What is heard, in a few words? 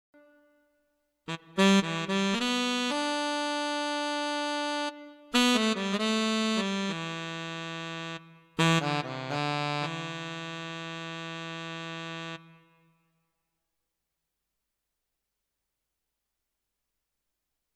Roland BK3 Sax